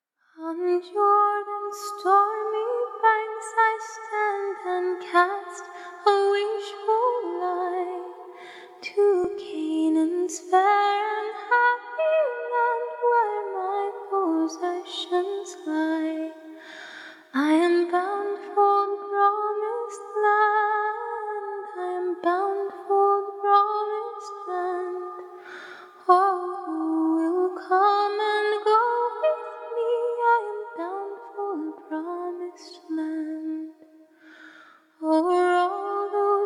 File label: acapella hymns